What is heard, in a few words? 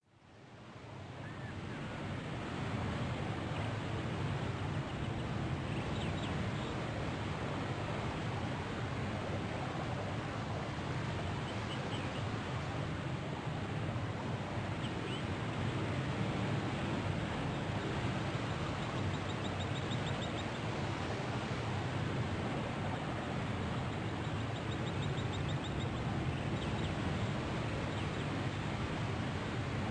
Nature; Interview Success